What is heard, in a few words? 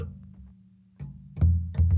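Calm Far, music; From Dust; PS3; Xbox 360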